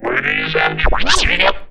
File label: SCRATCH; USB Soundscan vol.18